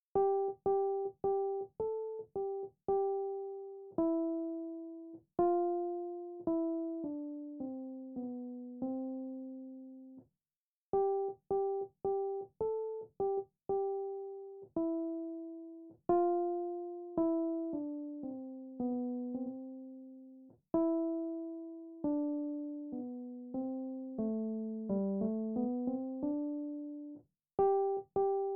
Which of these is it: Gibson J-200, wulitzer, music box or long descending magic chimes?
wulitzer